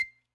Hohner Special20 Vib release C6, Harmonica; Aerophones; Releases; Free Aerophones

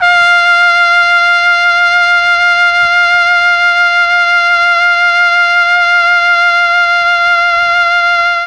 F3 TRUMPET, mellotron; CHMB TRUMPET

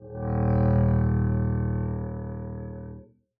arco eb1 f down, double bass; dsmolken

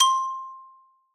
Xylo Hard C5 ff far, Hard Mallets; Idiophones; Struck Idiophones; Xylophone

